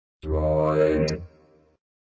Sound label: droid sound effect